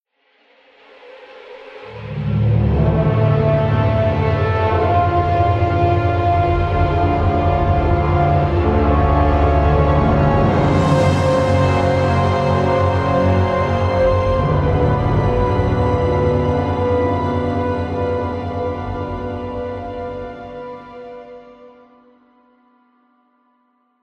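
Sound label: Music Lattice Activate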